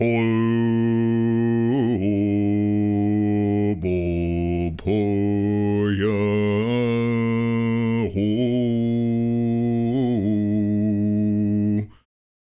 Male NPC Song

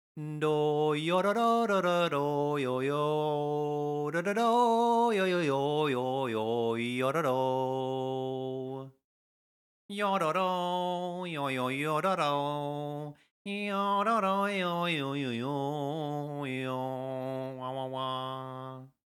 sing

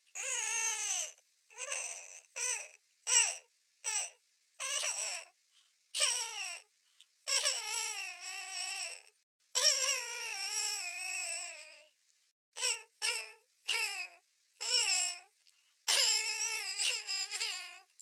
greyroot bud scene or menu staggered wimpers; Hollow Knight: Silksong

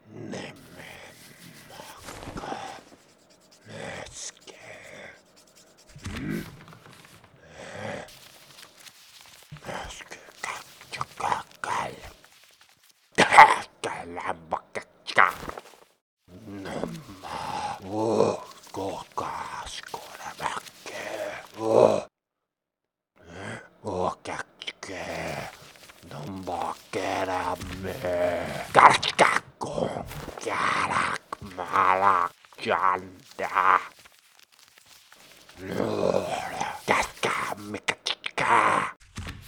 centipede mutter loop; Hollow Knight: Silksong

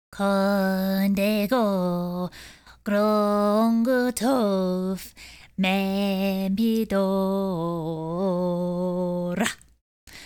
Female Sing; Hollow Knight: Silksong

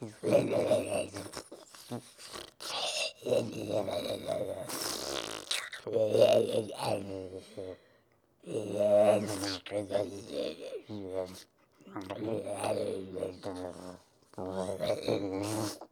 sleep loop; SF sleep loop

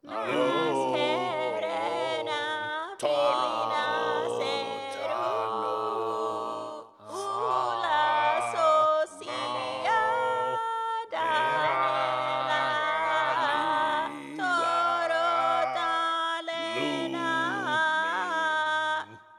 snails sing

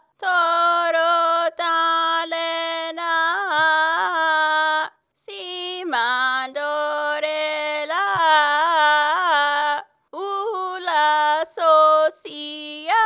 sing

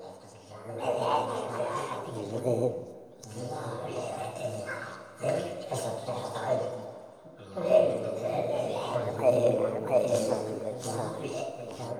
crowd loop distant; SF crowd loop distant

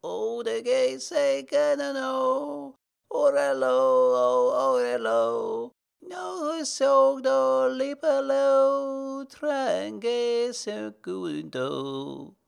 sing; Hollow Knight: Silksong

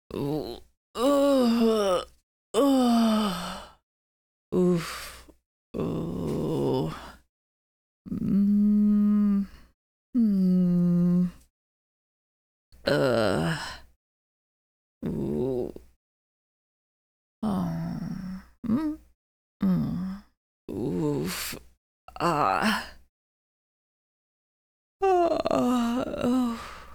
Pilgrim Female Moan Long

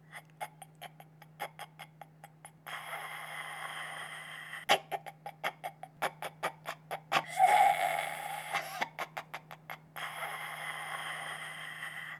scissor farmer idle loop; Hollow Knight: Silksong

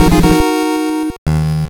Quick Draw; 3D Classics Kirby's Adventure

